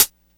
Nice Hi-Hat Sample A sharp, Royality free pedal hi-hat single hit tuned to the A# note